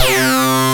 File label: ARP Synths, RUMBLE REZ C1; E-MU Formula 4000 Series Vol. 3; Analog Odyssey